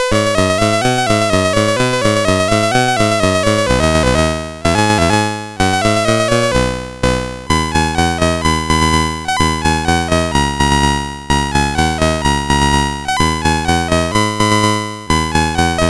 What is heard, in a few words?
sid, CSG8580R5; Booga-Boo the Flea